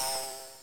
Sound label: Ball Flies In; SNES; Bill Laimbeer's Combat Basketball